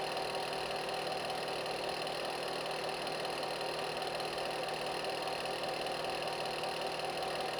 vent; ambience; AssaultCube

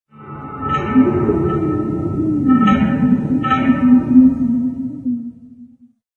pole rattle; random amb sfx

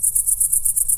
cicada short; random amb sounds

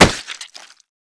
body medium impact soft

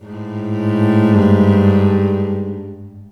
smoker bacterias; Left 4 Dead 2

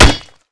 metal hit high armor low damage; Mount&Blade Warband

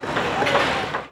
construction; Hearts of Iron IV Death or Dishonor

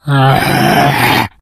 shout, infected; npc, alert

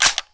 rifle slide forward; weapons, rifle desert, gunother

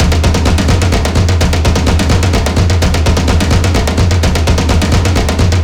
drums; Left 4 Dead 2; zombat; horde

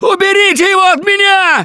scream while pounced; Gambler; russian

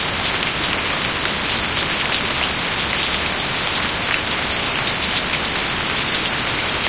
surface rain med loop; ambient; ambience; rainscapes

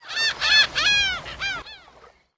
random gulls; random amb sounds

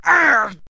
man hit; Mount&Blade Warband

